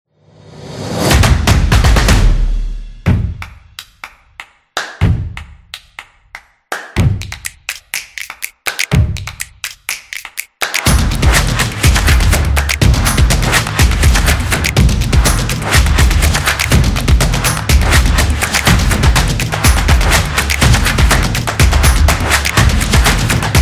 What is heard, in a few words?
This Is Drums